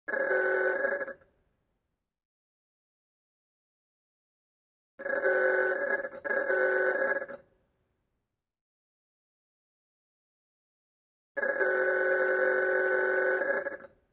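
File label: old mechanical horn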